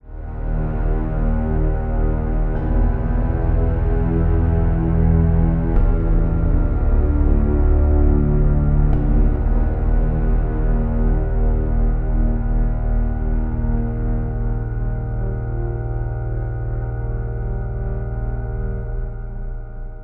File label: amb music